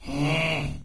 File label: mystery program error; windows 9x; sound schemes